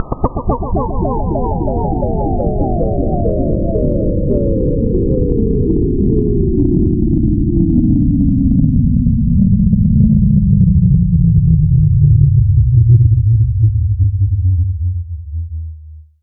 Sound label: Sweeps Down